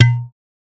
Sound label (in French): pounk